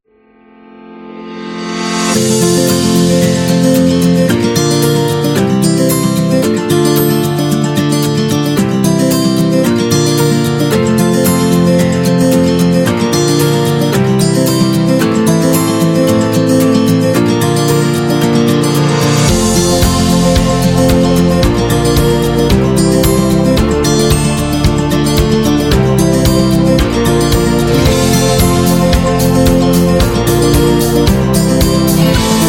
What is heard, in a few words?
Wedding Upbeat, love story